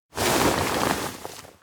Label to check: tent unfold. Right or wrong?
Right